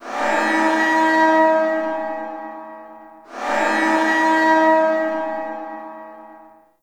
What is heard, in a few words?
Galleon Alarm; Ambience; Warframe; Grineer Galleon